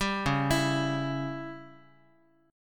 C sharp Diminished C sharp down Guitar 4A 4E 5A 5D 5G sharp 6C sharp; C#dim arpeggiated; JGuitar